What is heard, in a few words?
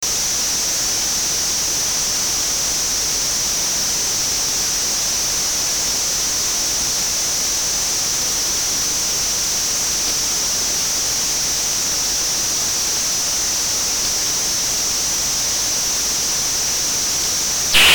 ultrasonic talk projected 22khz tone compared to base signal